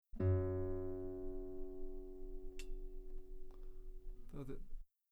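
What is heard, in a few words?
guitar F sharp 2 very long piano normal; bananenkeyboard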